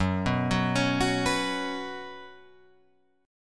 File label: Gb Suspended 4th Gb down Guitar Open F, Gbsus4 chord; arpeggiated; JGuitar